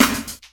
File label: fx snare; Trance Explosion Vol1